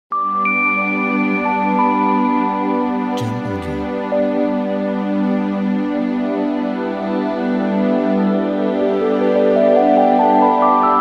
Ambience Intro Logo

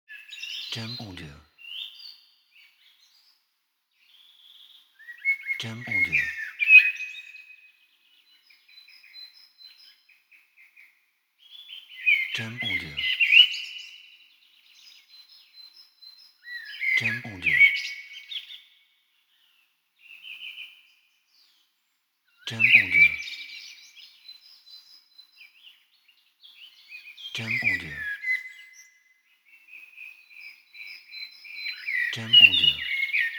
Birds in Forest

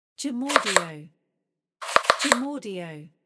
Cutting Cucumber

